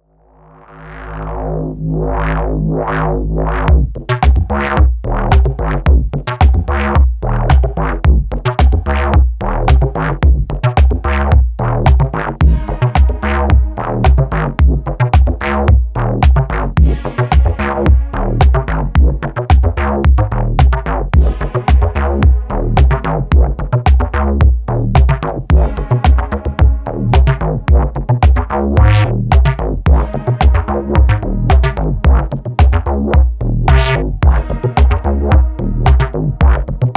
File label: imploded tremolos